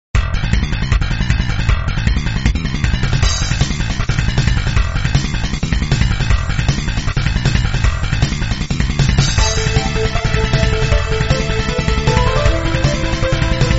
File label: battle; game; bgm; arrows; maoudamashii